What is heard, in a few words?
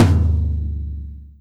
TOM; osu